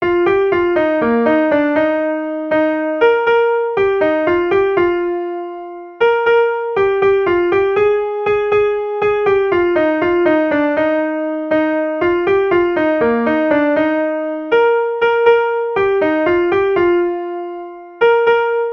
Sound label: piano; The Holly and the Ivy; Piano recording (computer generated)